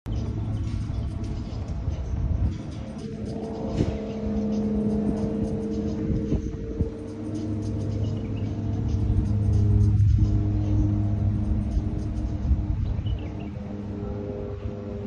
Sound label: ferris wheel; چرخ و فلک